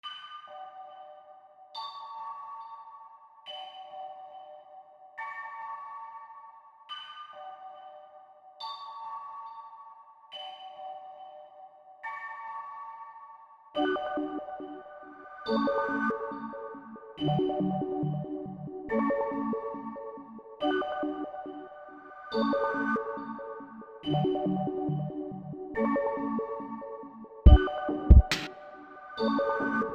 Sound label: sine wav beat